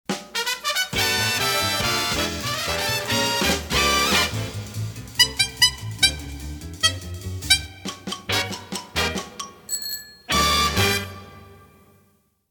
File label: Horn; Pams